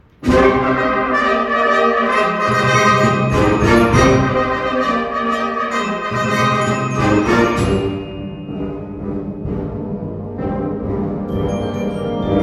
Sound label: Ukrainian Carol